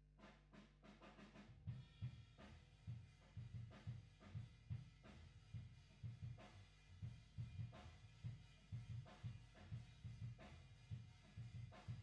GTR B

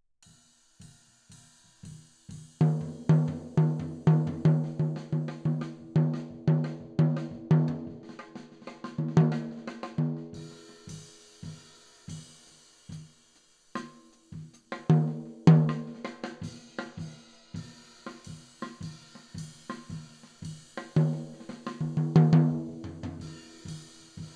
Tom

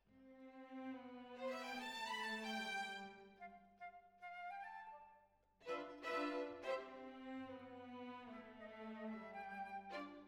Violins Over

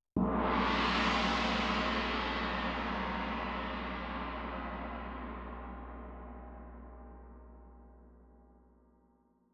Tam-Tam Close

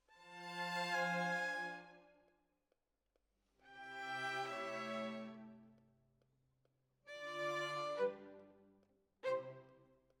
Violins, jazz for piano

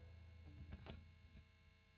E. GTR Royer OD

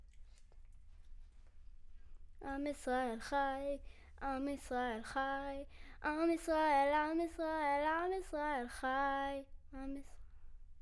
Children Choir; Studio Bekol Children Choir

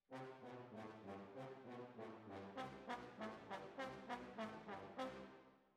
Trumpet

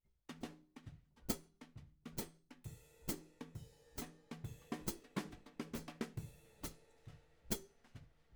Hat

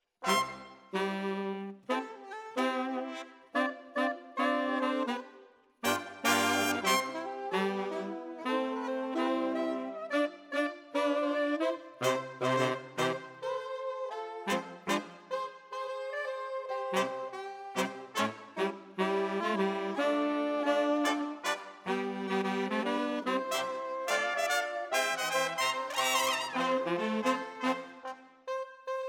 Sax

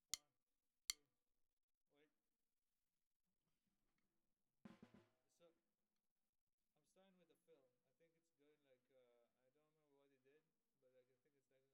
SNR

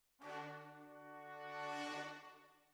Hall

